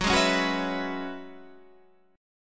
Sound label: Gb 7th Suspended 2nd Suspended 4th, Guitar, Standard; strummed; JGuitar